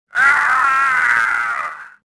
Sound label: death, swamp